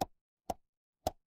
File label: ui pop